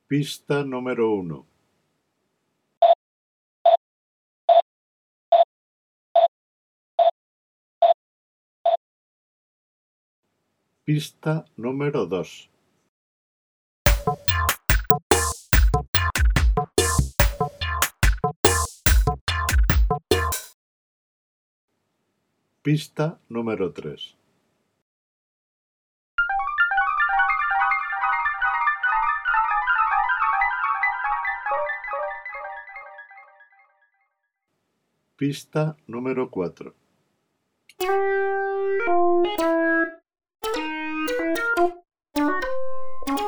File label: electromix